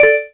Button OK

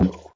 Collision

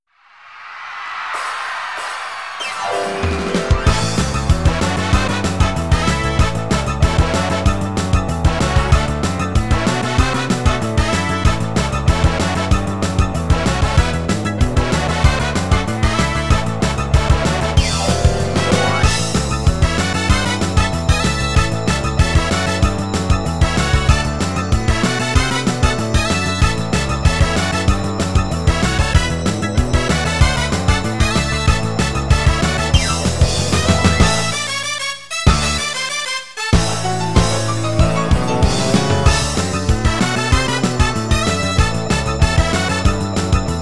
Rock'n'Roll Show; Dance Dance Revolution Disney Dancing Museum; N64; Goofy's Rock'n'Roll Show

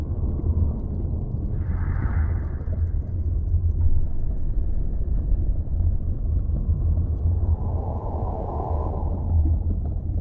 Ambient; tiny bunny full soundtrack 2019

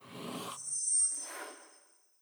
SFX end of month menu

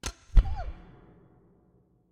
bell Snap; Desert SFX; Mech

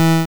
Gambler Lose; Gloom

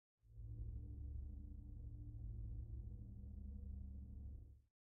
Desert SFX, Desert Mech Loop stall; Desert Mech A48

